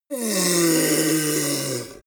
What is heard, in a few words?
ogre grunt pain short; kreaturen